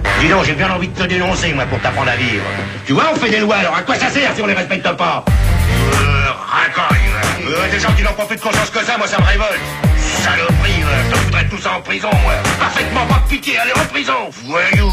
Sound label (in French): Chanson rap